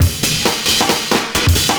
CYMB, Breakbeats

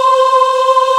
Aahh Chor, VOICE; Best Service Gigapack 1

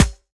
BD SD; Best Service; Dance Mega Drums

